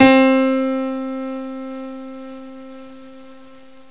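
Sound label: bright piano; gmplay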